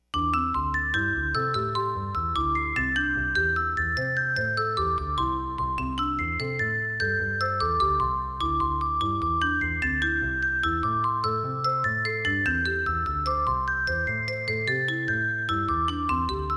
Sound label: pentatonic trio